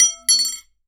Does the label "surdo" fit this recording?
No